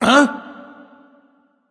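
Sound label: nonverbal surprised; smite; voicepack; ullr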